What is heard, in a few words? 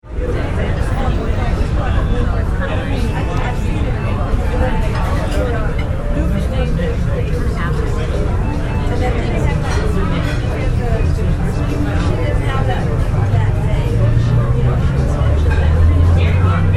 Sidewalk Dining